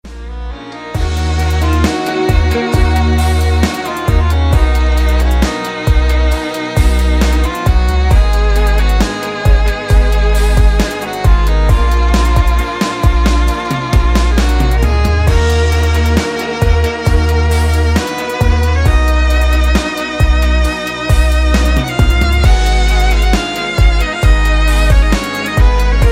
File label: instrumental